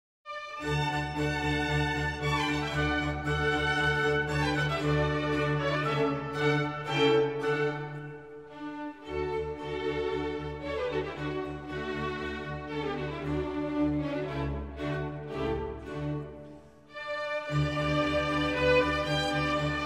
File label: Violin Concerto in RE; Prague Dvorak Chamber Orchestra